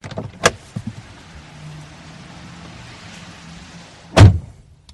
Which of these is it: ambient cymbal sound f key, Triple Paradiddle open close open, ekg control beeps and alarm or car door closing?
car door closing